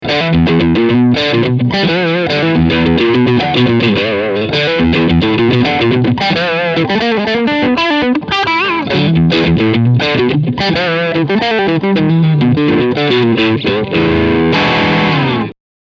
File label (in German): Steg HB und SC Crunch